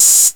Analog HH Open; GMPlay; Drums; XG

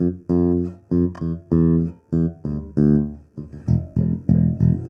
Statement, Rock Bass Loops; ACID MUSIC

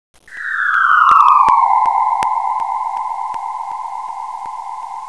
wind

